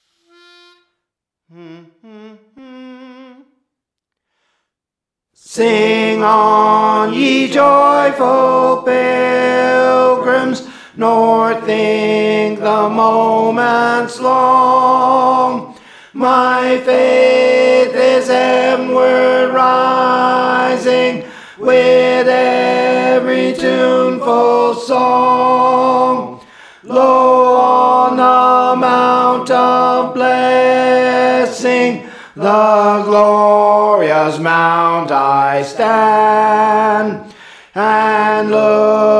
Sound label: Tenor, Praise For The Lord Training